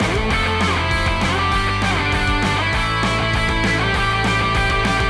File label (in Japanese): オクターブ奏法; アレンジテクニック